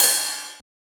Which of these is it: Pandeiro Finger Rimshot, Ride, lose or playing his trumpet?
Ride